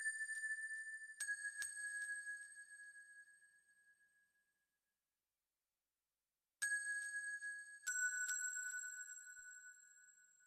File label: soft n calm; ringtones